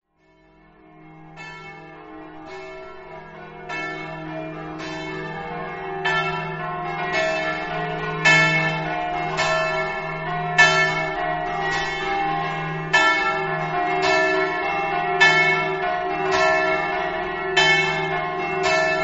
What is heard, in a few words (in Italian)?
lauzzana, campane